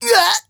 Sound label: BOSS man hurt